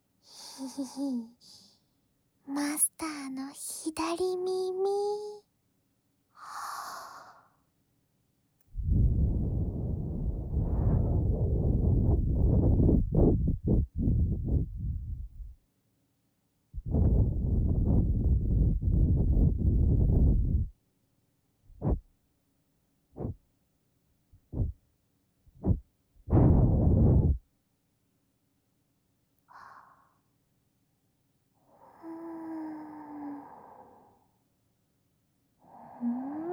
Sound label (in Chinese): 使用長崎名產鼈甲製掏耳棒來掏左耳; 蓄音レヱル 海; CV.本渡楓